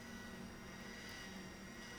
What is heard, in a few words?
pulses, BALAENOPTERA; MONACO 2022-07